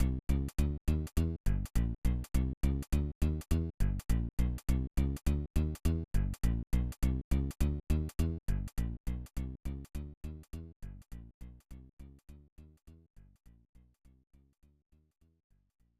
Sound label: Slot Game BGM - Memory Game BGM; SMB3; Super Mario All-Stars; SNES